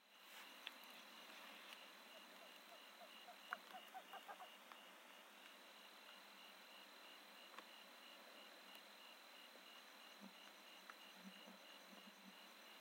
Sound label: Western Screech Owl calls